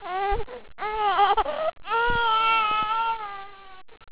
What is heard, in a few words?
crying